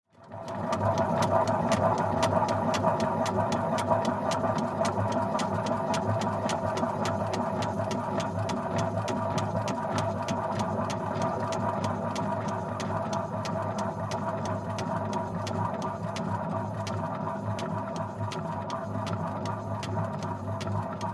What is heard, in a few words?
Gramophone Spinning The Disc; record players